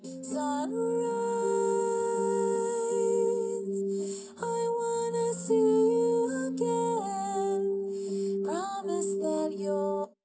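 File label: with drums, 130ms, 20cm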